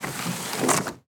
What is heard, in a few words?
Window Slide Open